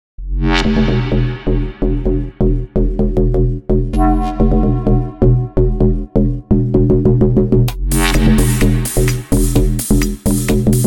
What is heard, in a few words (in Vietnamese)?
Nhac video san dien thoi trang duong pho soi dong